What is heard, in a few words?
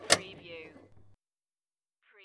Pinball sound, click and roll